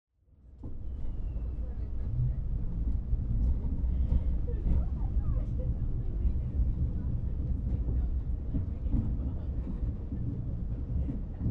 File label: subway train